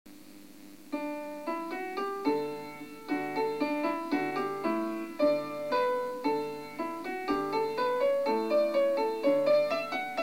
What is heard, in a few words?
Danse Anglaise